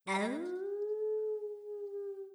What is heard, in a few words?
howl